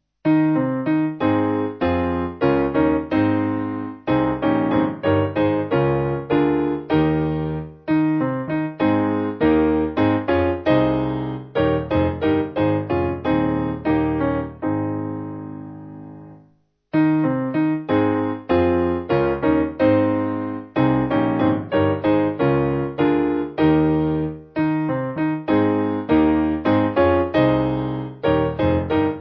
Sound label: Piano; The Lord Is King; Niagara